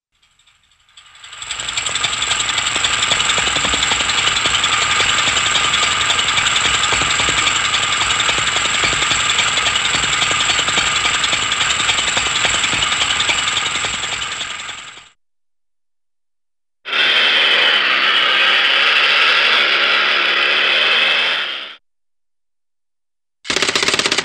Noisy Small Engine - Slow Steady Idle - Wind-Up Toy; Cartoon; Hollywood Edge; Cartoon Trax